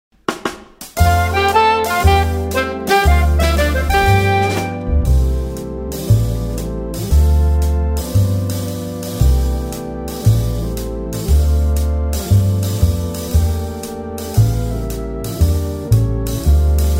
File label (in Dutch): improvisatie lied orkest